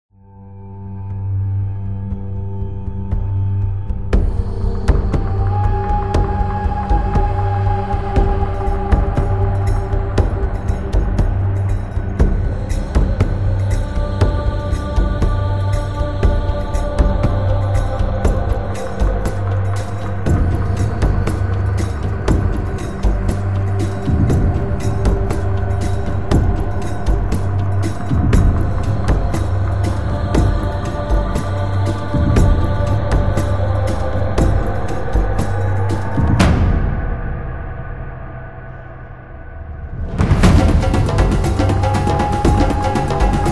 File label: African Drum